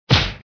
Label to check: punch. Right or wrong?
Right